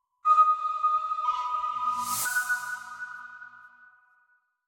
logo

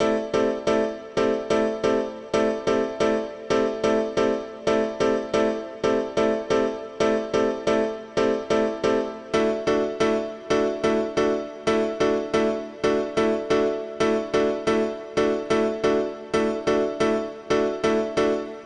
Dance Piano; super crush k.o.

